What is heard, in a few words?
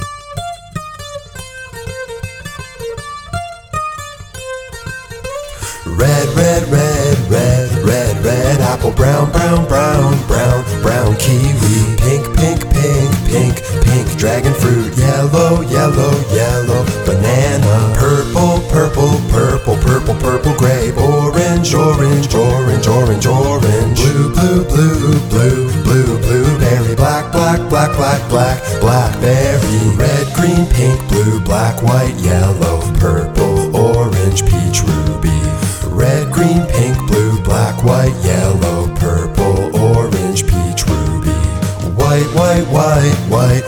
Fruit Colors Song; English Tree TV